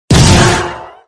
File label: die; ares